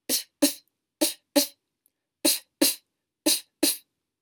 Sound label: bad snare